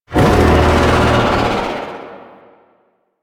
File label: Void Dragon Vocal Set Pain; Enemies; Warframe; Duviri